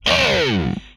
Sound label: Hit, Vocal